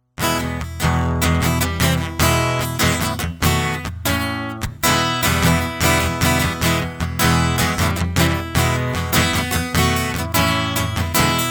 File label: acoustic